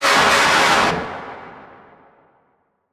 Title Transition Blast; Production Element Title Transition

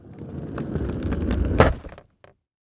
Household Luggage Rolling Sudden Stop On Cement

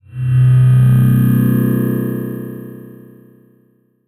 Title Transition Digital; Production Element Title Transition

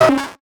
Multimedia Internet CD-Rom Flash Hi-Tech

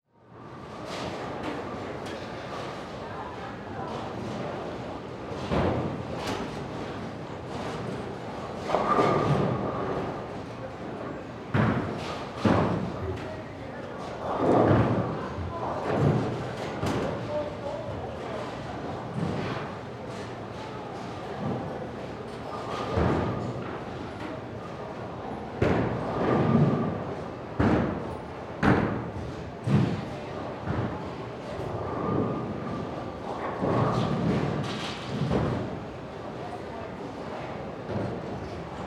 Ambience Bowling Alley 180D; AdobeCC

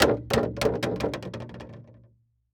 Impact PVC Pipe Drop On Boardwalk; Impacts

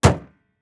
Auto Saturn Close Hood Exterior; Transportation

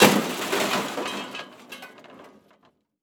Crash Metal Objects; crashes; AdobeCC

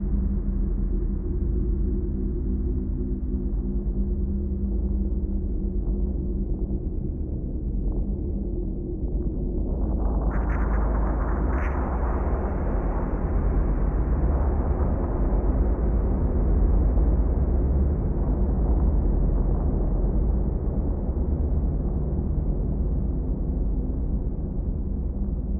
Deep Drone; Cinematic Trailer Music; Bonus - FX & Transitions; BlueFX

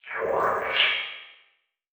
Transition Whoosh Warble

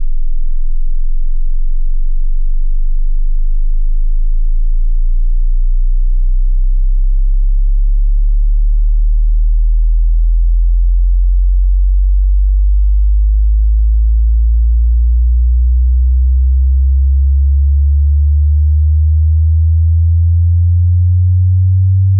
sine sweep log

